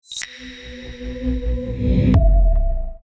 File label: logo; LMT